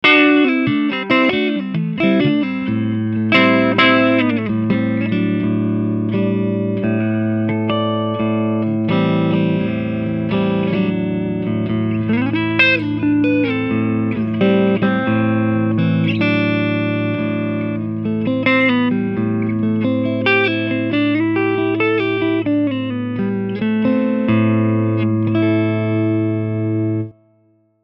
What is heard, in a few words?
Lick Front SC Clean